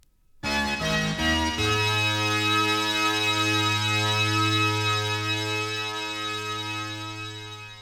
topformat maxi jingle single

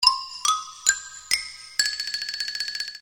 SNEAKY HINT; Rosegarden; Z-TV

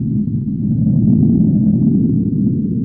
passby big ship loop

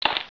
DRIP, Water

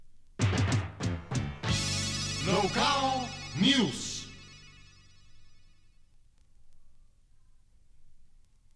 topformat maxi jingle single

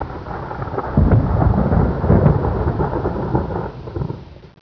THUNDER; Het Weer

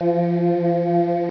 OOOH LOW; sound effect

